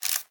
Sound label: Document Scrolling